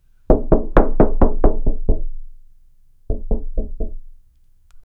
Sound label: three aggressive heavy